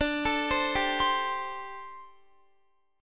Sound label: D 7th Suspended 4th, down, Guitar